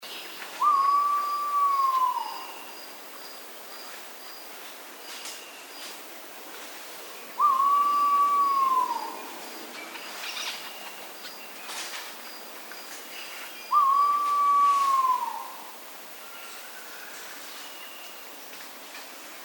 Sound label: pheasant pigeon